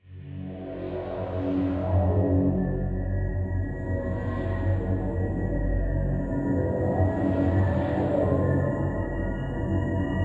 Drone; Homicipher Prologue

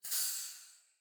museum door

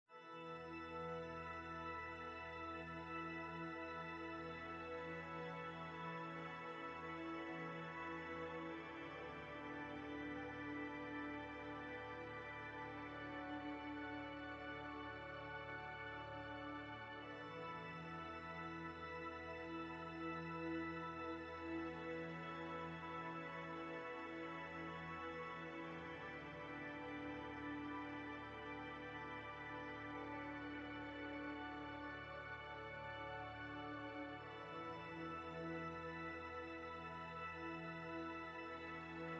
Desert Music, Section A1 70bpm Organ

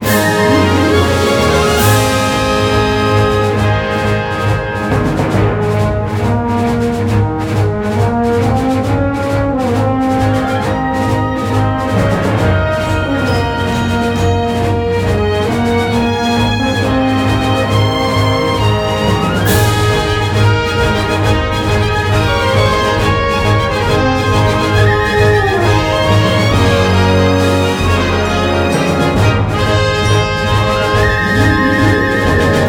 P V P Victory Intro

